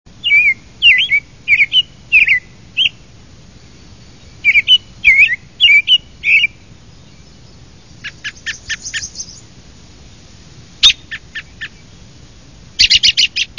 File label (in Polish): drozd wędrowny - śpiew i nawoływanie; wroblowe; turdidae; Turdus migratorius